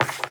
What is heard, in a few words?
dice pick up